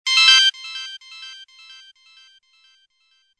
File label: Whats Up Ring